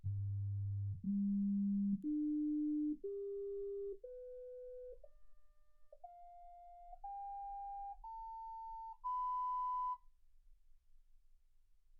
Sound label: sinus tones 48kHz